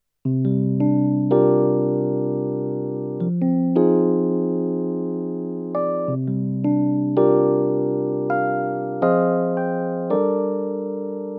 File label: Roland Go Keys E Piano Tremolo